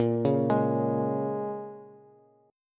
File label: Bb-Minor 7th-Bb-down-Bass; Bbm7 arpeggiated